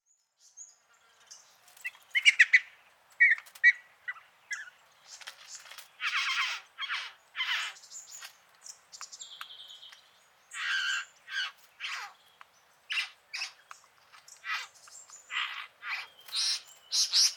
Satin Bowerbird Ptilonorhynchus violaceus

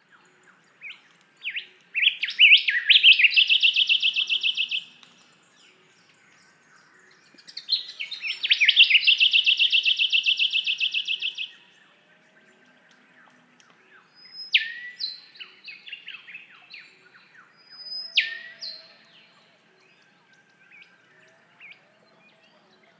Rufous Whistler Pachycephala rufiventris

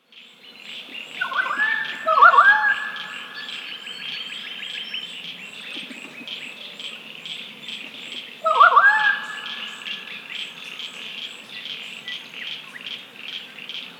Pied Currawong Strepera graculina

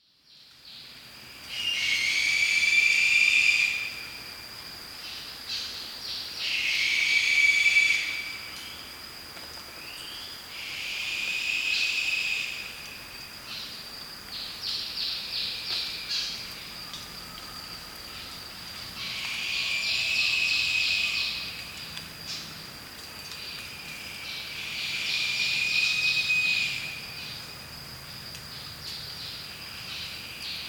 Lesser Sooty Owl Tyto multipunctata

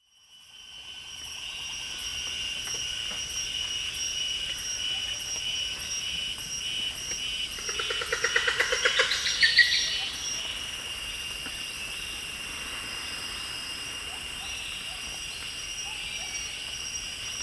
Red Leaf Monkey

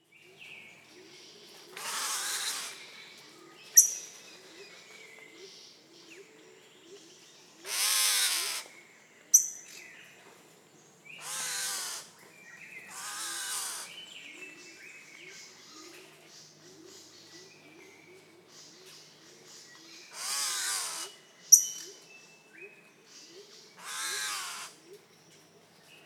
Spotted Catbird Ailuroedus maculosus

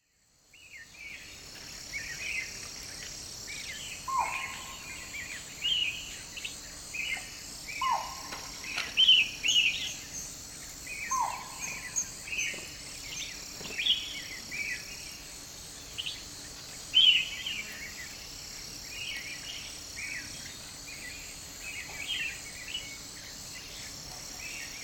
Deer Spotted

